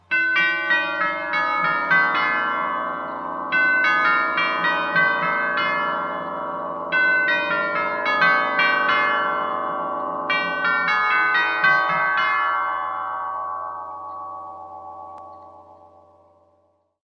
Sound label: herschede whitington